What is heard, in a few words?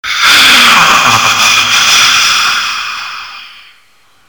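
zombie female breath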